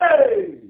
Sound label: Man Scream